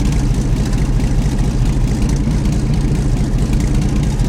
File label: cromwell engine rpm load; cannons; War Thunder